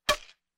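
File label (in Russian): zvuk udara, badminton; Звуки игры в бадминтон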